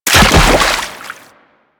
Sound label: Land Heavy Water, Footsteps; Enemies; Warframe; Teshin; DE